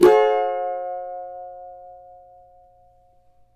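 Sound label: G minor, Guitars; Spectrasonics; Hans Zimmer Guitars VOL-2; CAVA CHORDS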